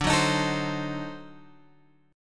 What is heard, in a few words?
D 7th Flat 9th D down Guitar Open F; D7b9; strummed